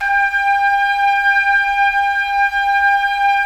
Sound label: Trumpets; Roland - LA Composer vol. 4